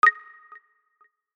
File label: match join; « DEKU » - MHA